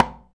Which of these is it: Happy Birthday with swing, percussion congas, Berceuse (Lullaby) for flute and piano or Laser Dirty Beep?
percussion congas